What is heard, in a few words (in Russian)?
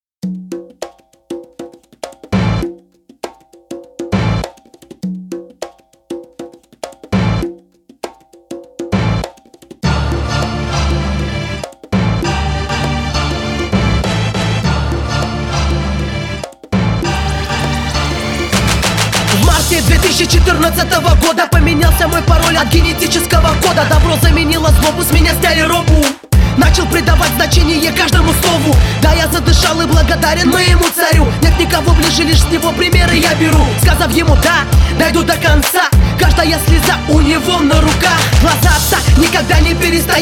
Композиция в стиле Rap